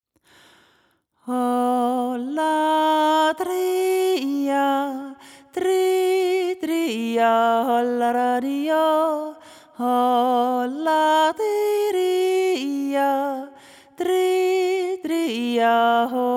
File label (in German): jodler einzelstimmen; hasbacher; langau 2025